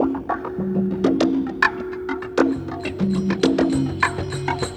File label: Ambi Groove